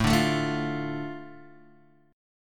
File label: A Suspended 4th Sharp 5th down Guitar Open D